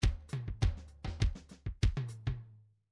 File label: bdf midi file grooves latin latin a